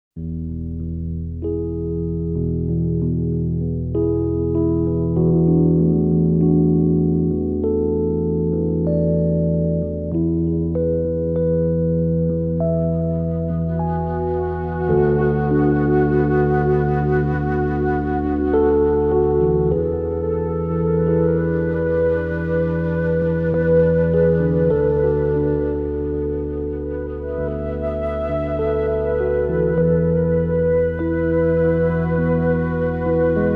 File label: music yoga